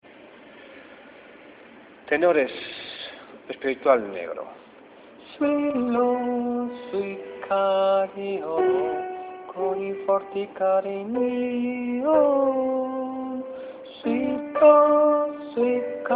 tenores espiritual

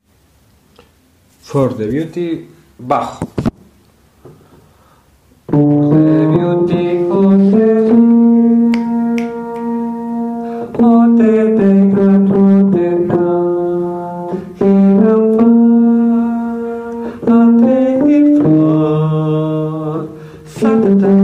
bajo

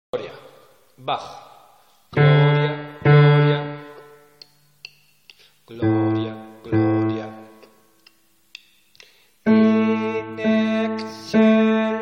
bajos

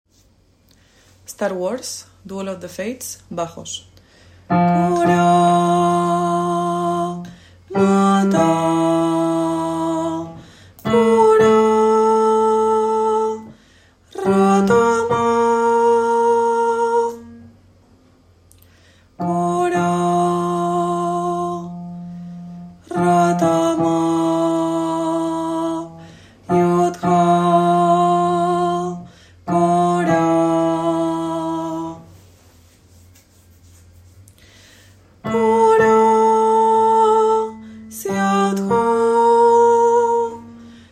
duel baj

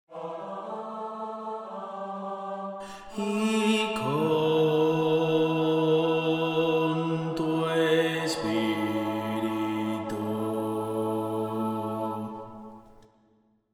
BAJOS RESPONSORIO; Misa Brevis Jesus

